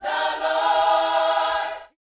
Vocal, Gospel, PHRASE; Spectrasonics

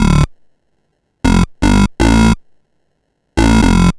Sh09 Bass Loop, 120BPM

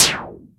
ELEK SNR; ELECTRC

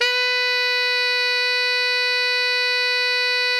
TENOR FF B 4, saxophone; Greytsounds; Philip Wolfe David Crozier's Saxophones

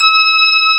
HAM A6, HAMMONDS; East West; Global Sounds

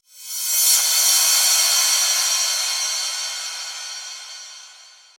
Reverse Cym, Cymbals

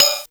Swing Crash Casio SA-1

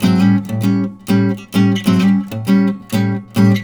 riffs, Blues Guitar; ILIO; Fretworks

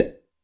Lip Pop, Drum Machines Alesis D4

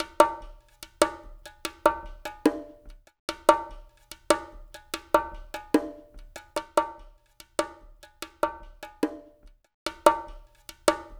BONGOS; Spectrasonics; Liquid Grooves 1 & 2; CONGAS+BONGO

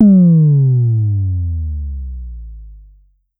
Pollard Syndrum Sub

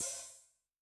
HiHat, Yamaha DTXpress-II

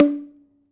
Prepared Drums

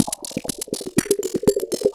Glitchy, Beats

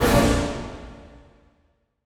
HIT, Orchestral; Orchestral Loops; 128 MANHATTAN

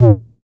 606 Dist. Tom

